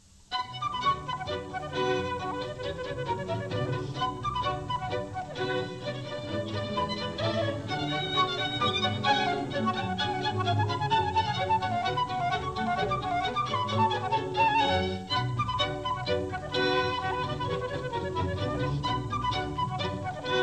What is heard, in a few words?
Orchestral Suite No2 BWV1067; J S Bach